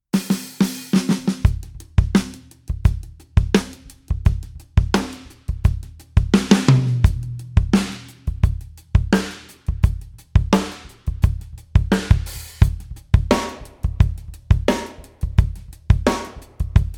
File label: drum vault video beat dry